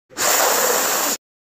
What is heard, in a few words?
sniff